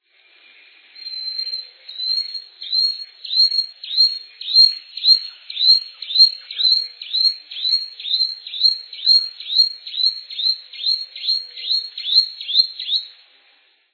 choquinha-de-garganta-clara

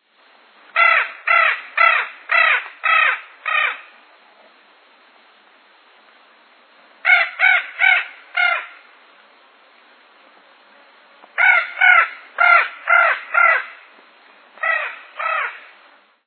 ararinha-azul

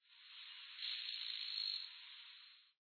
cabeca-branca

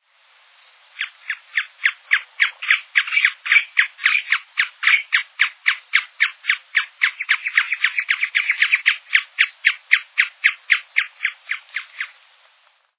papa-formigas-pardo

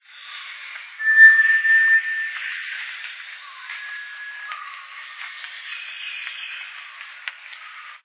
inhambu-preto

